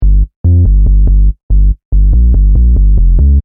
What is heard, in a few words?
Techno-Trance Sub Bass Loop In G 142 BPM